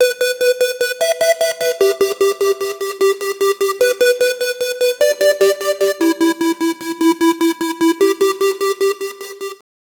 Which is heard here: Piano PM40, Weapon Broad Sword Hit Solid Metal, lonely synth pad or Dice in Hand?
lonely synth pad